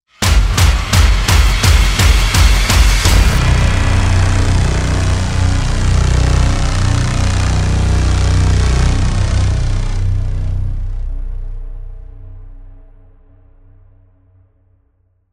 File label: Production Music Trailer Tools Buildup; CATastrophic